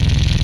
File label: Helicopter Loop, retro arcade; MMRetroArcadeSoundsPack; Vehicles